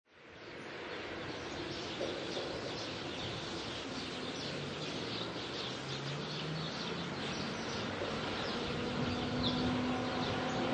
Country Road Truck Drive By, General countryside ambience; General countryside ambience near rural road; Birds tweet, breeze through trees; A small truck; Lynne Music